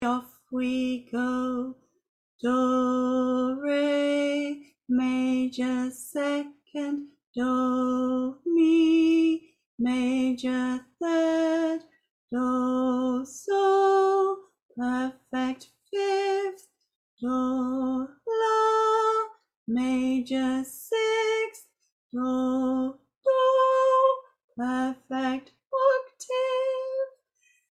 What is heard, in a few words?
Pentatonic scale with intervals